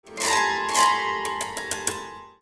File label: MUSICAL